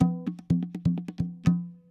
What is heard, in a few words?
PERC LOOP ETHNO 125; eJay